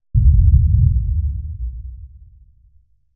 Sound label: Explosion, 5.1 Surround; Sony Pictures Sound Effects Series; SUB